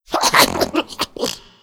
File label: laugh